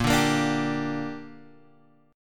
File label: A sharp 6th, guitar chord